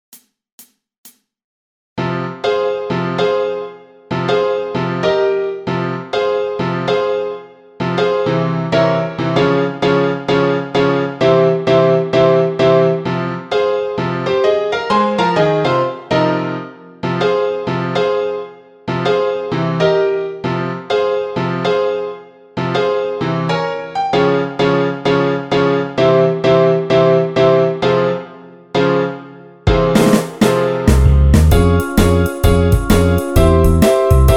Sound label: inst